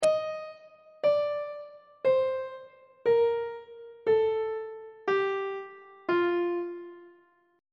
f mixolydian mode descending; on treble clef

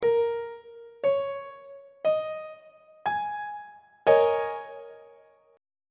e flat dominant 7th suspended 4th 2nd inversion; on treble clef

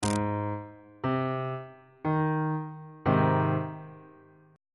g sharp major chord on bass clef

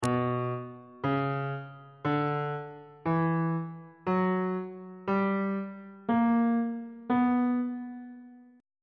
a double sharp harmonic minor scale on bass clef

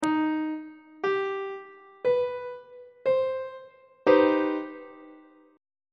c minor major 7th 1st inversion; on treble clef

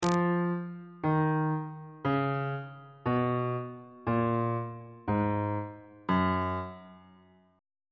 f sharp ionian mode descending

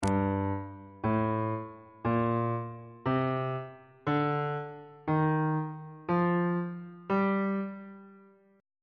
a double flat minor scale on bass clef

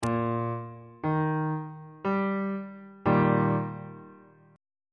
e flat major 2nd inversion on bass clef

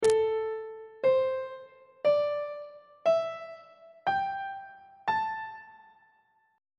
a minor pentatonic scale on treble clef

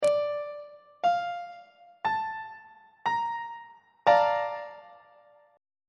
b flat major 7th 1st inversion on treble clef

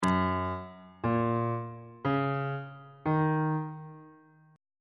g flat major 6th chord intervals on bass clef

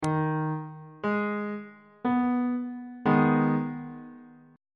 g sharp minor 2nd inversion on bass clef

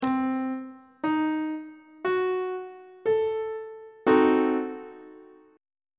d double flat diminished 7th chord; treble clef

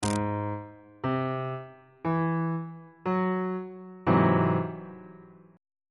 g sharp augmented 7th chord on bass clef

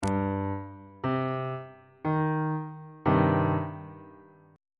d double flat minor 2nd inversion on bass clef

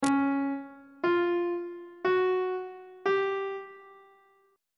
d flat 4th interval pitches; on treble clef

